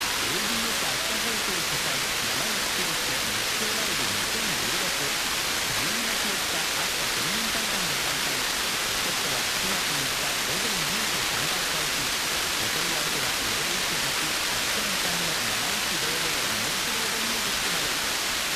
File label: 90.1MHz; ABS